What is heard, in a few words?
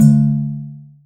section fail